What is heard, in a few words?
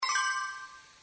Round start